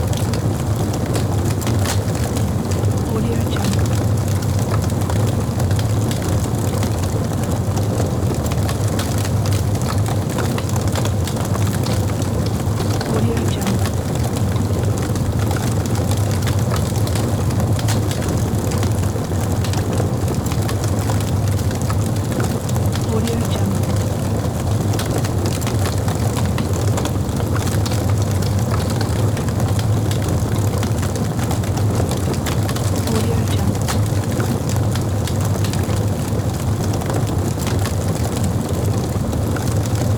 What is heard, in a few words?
fire; سوختن چوب زیاد; nature sounds